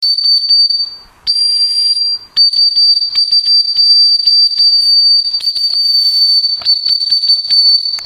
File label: dog half